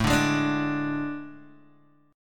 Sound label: A Major 7th Suspended 4th, Guitar, DADGAD; chord